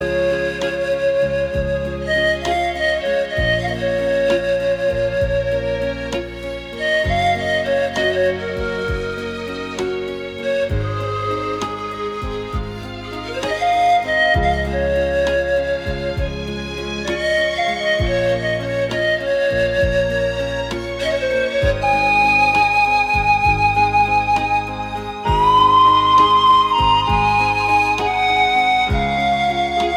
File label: Pan pipe